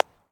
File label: Baseball Bounce Dirt